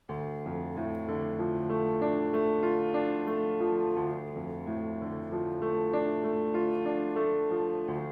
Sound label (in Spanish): Re menor arpegio